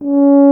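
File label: brass tuba; Miroslav Vitous; Solo Instruments VOL-2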